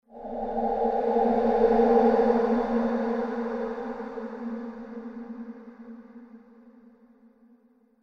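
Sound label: short ambience; crysis warhead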